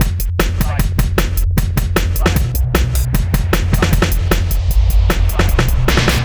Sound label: Hardcore Breakbeat, 153 Fmin; Big Fish Audio; Hardcore Breakbeat Action